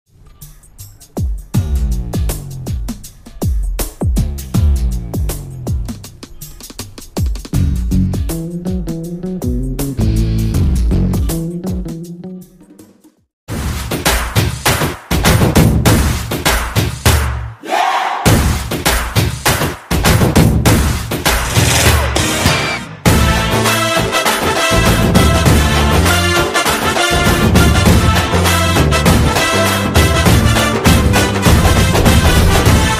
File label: drums beat bgm; gabbar singh; pawankalyan